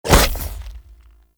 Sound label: Shoulder Barrage Fire; Enemies; Infested; Warframe; Femur